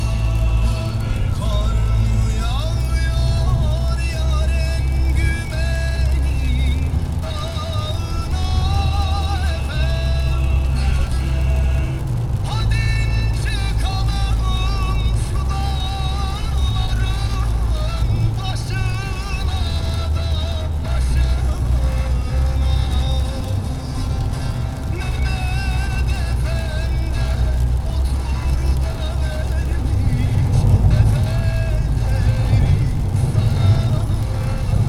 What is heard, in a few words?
Car Radio